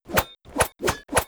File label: Special Attack Slash; Opsive; UltimateCharacterController; Melee